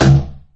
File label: plastic barrel impact hard; physics; Garry's Mod; hl2